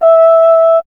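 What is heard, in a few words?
brass French horn; Miroslav Vitous; Solo Instruments VOL-2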